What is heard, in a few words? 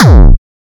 Hardkick